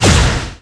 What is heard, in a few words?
Lizard Skill, mon